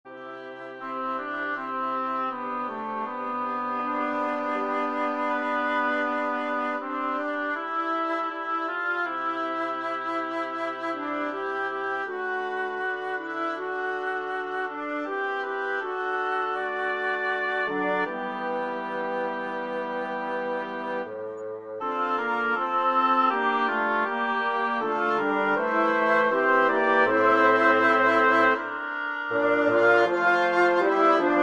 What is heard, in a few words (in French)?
Extrait sonore généré sur MuseScore avec des timbres de bois; Durée totale : env 1min30; pour chœur; Quatre petits Motets Trans; Pièce en attente de création